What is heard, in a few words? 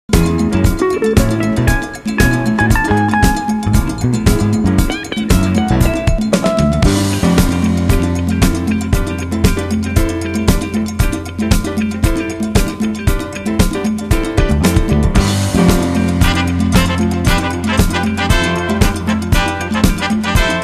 Calypso Breakdown